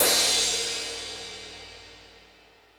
Crash, Drum Machines E-MU Pro-Cussion

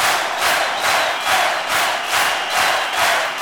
Crowd Effects

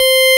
KEY XFM, Organs; Club-50 - Foundations Roland

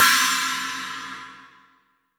CYMBL THANG, FILM PERC; Drums In Your FACE!

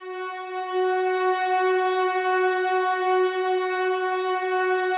slow strings F#3, Yamaha VP-1; 90 s Sample CDs; Music Store

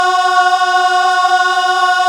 GOSP. AAAHH; Sound & Vision - Gigapack II CD 1 (Roland); HAL Gosp. Aaah 1

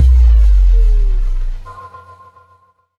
D+B

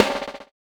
BUZZER; Spectrasonics; Metamorphosis

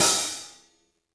hihat - opened; JazzFunkKit

